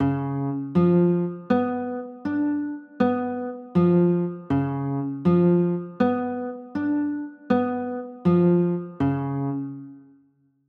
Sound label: d flat 7 b9, chords